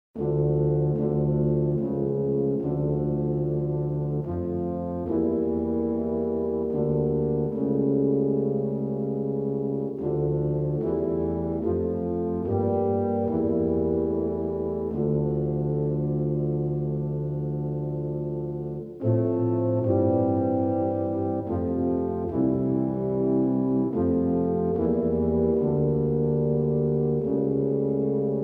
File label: Coventry Carol/Carol of the Bells, Brass Choir; Martin Schmid Blechbläsernoten